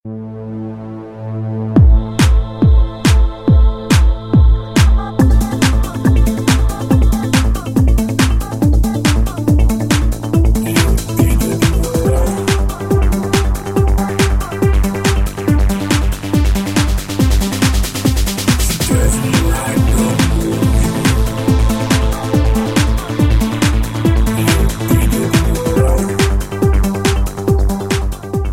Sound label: Dj Bass Effect